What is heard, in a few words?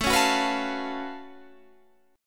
F sharp Minor Major 11th A